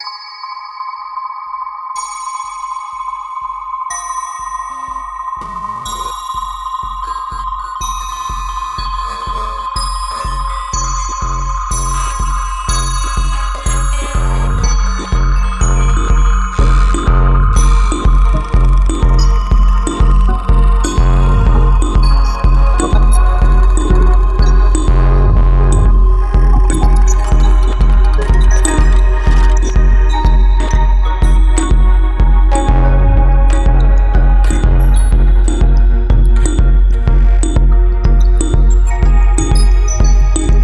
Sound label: Dronage; Wetterberg